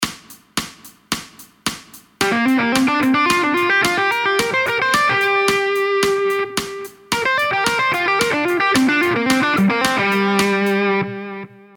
Pentatonica minore 123 semicrome

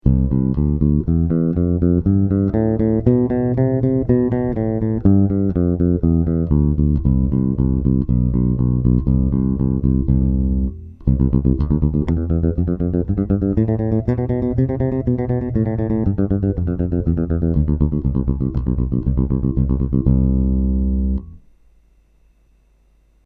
Basso; Basso Virtuoso 7

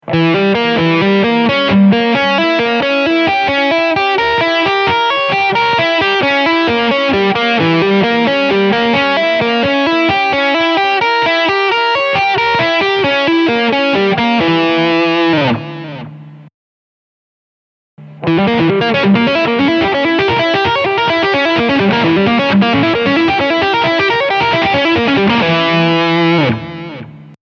Mi dim

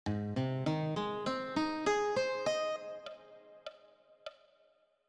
arpeggi

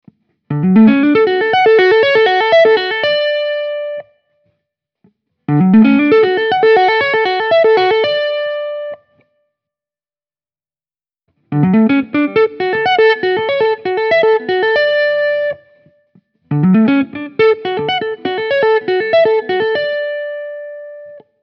Tapping Re

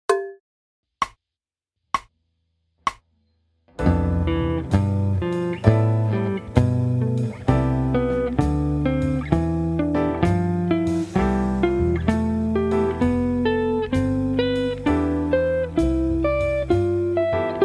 es. major 7 exercise settime consecutivamente

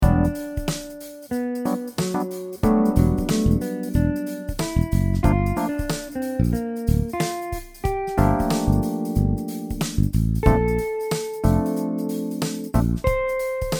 con chitarra

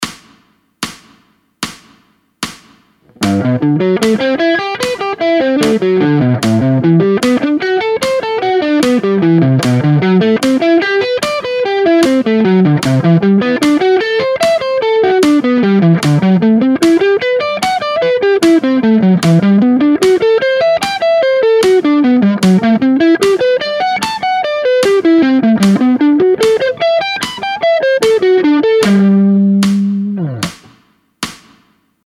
Arpeggi Modali Ionico Sol fast, guitar

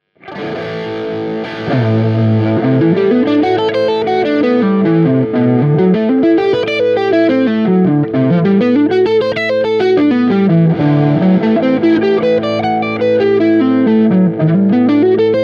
Arpeggi Modali dorico

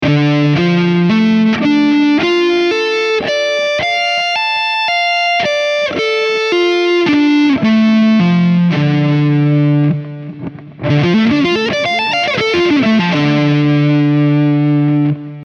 triadi preparatorie mano sx Re min

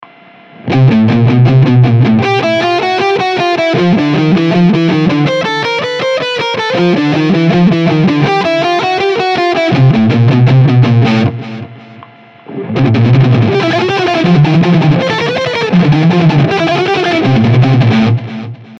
crom contrario salto 3 corde

